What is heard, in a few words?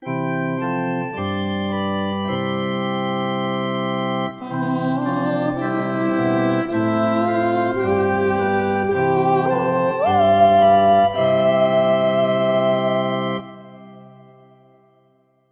Heritage Amen D